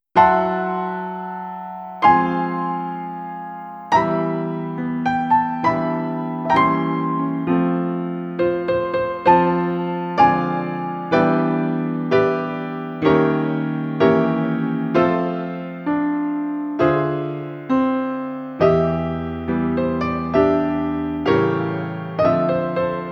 Solo piano; Jazz; Craig Satchell

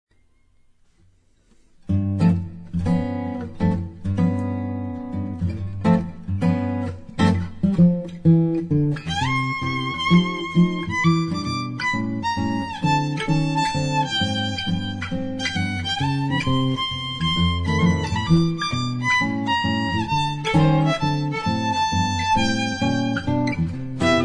Violin Guitar

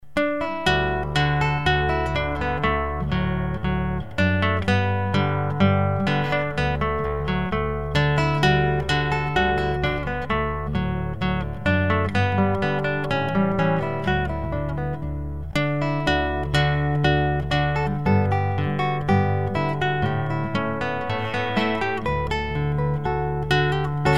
Reel, Guitar; ST Annes Reel

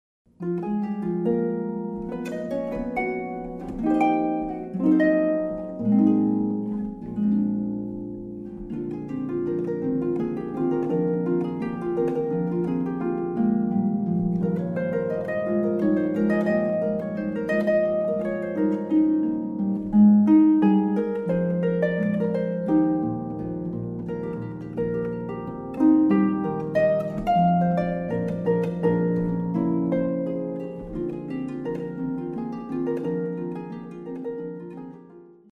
harpist; Classical

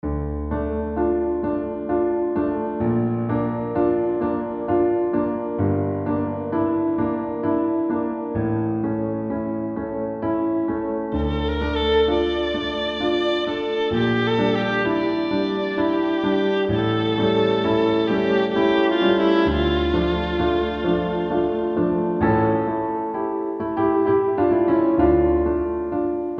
Serenade, Violin; Eclectic Violin Music

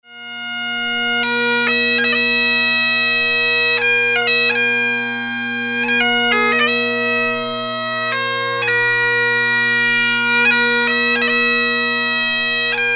bagpipes

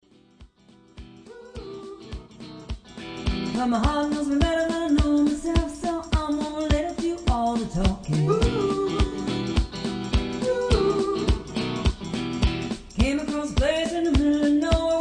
cover band

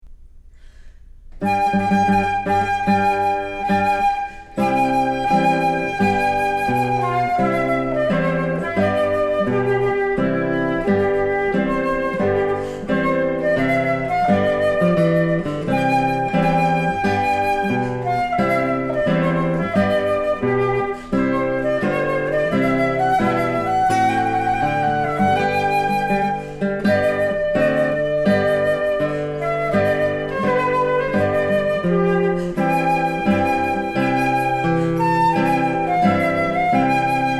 Trumpet Tune, Classical Duo; Purcell; Duo Sonoroso

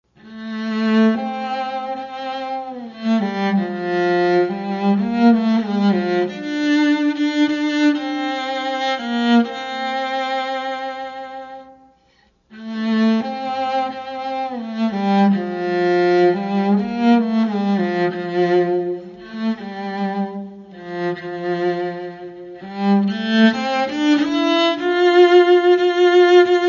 solo cello; Classical

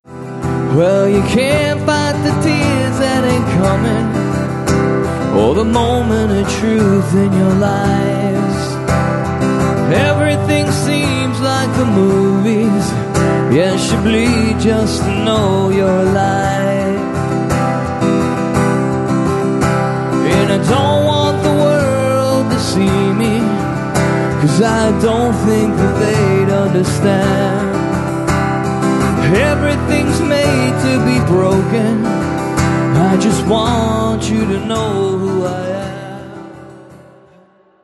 acoustic guitar

